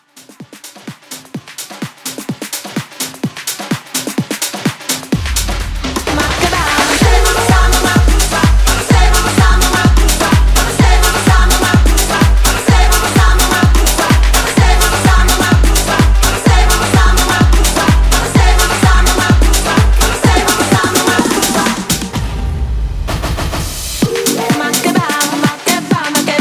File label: House Mixshow, 127